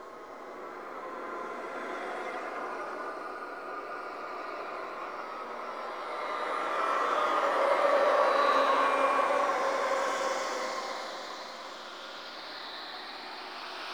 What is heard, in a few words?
Exterior Bed, Ambience; Sentient Devourer